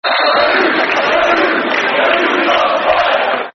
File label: Football Chants (Soccer Songs); Kanu Is On Fire; Portsmouth Pfc